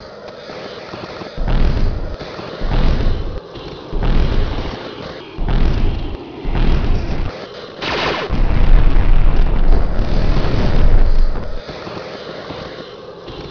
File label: ROBOT